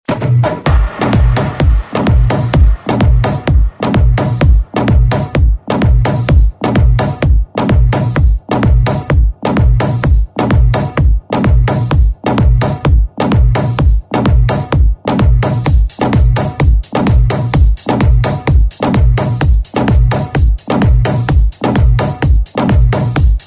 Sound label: Minimal Drumz